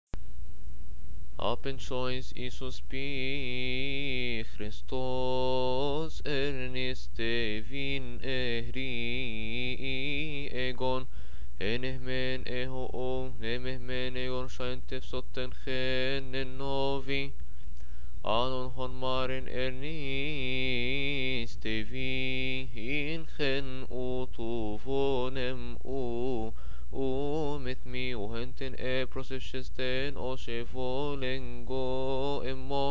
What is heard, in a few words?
Verses of the Cymbals, hymns; Great Lent